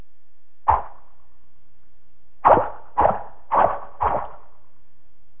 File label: plops